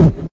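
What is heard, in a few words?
vox bass drum, BeatBox Male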